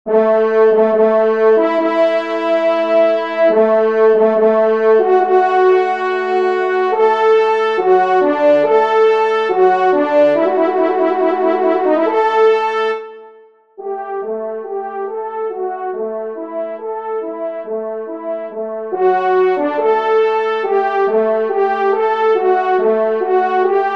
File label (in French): TRP; Marly; 1e Trompe; Victor Viney